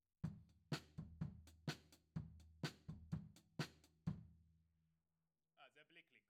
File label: Tom